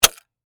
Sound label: Rat Trap Snap